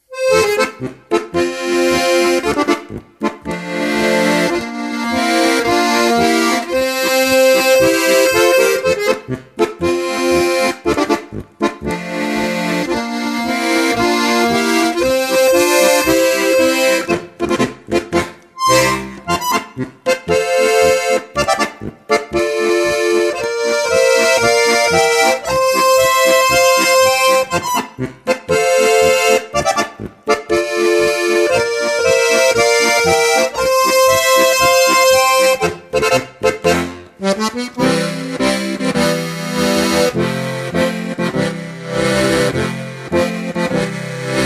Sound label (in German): harmonisch diatonisch kirtag marsch